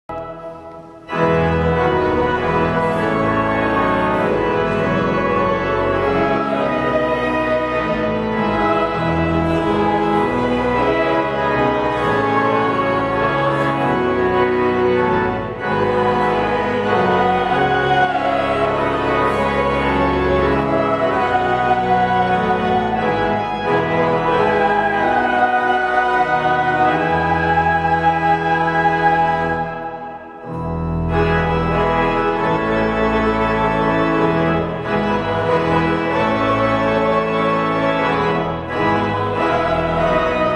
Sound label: hymn descant; Hymn 208; Victory